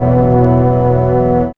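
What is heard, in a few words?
string pad; E-Lab; X Static Goldmine 1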